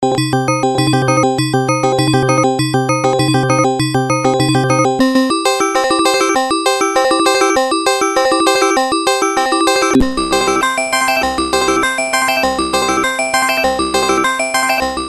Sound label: wave seq, evolver